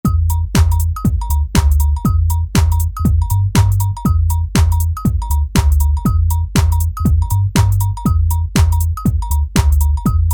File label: House